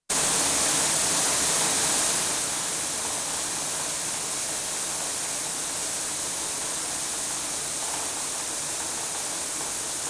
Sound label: Animal Feed Mill, interior, barley drawn up from silo to mill & crushed; Machinery Sound Effects; BBC